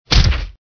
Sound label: punch